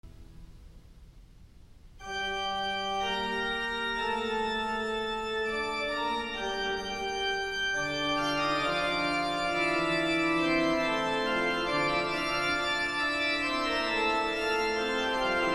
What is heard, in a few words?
Preludes, in g minor, Brass; III. No. 10